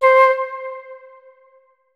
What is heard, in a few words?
flute notes; Hideout Furniture